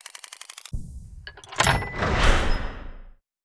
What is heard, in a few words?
Toll Machine Activate